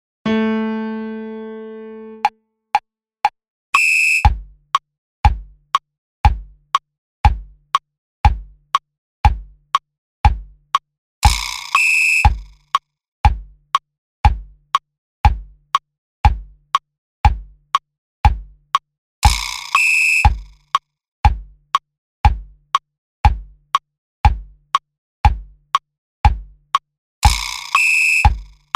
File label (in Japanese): 息流し 長い BPM120